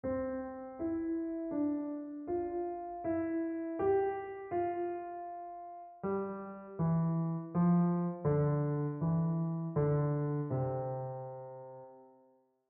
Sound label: Keyboardist exercise